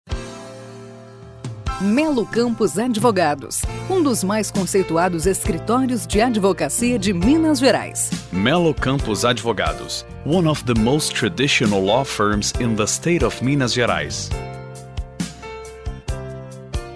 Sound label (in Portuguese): Espera Telefonica